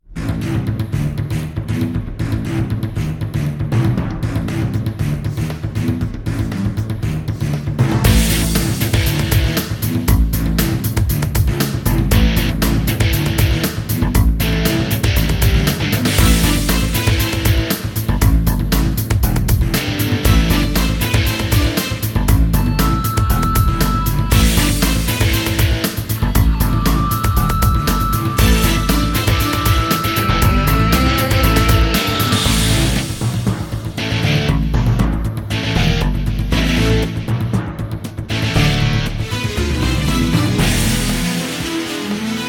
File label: Battle; star wolves 2 2022